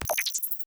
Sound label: Buttons, High Tech Interface Sounds; Lesser Vibes